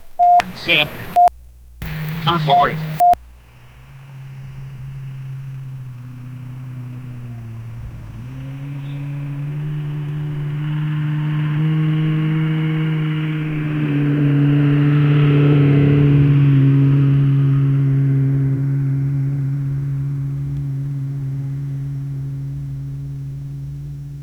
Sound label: clean isolated motorbike